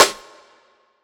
00s Wet Snare Drum C Key, snare drum sound tuned to the C note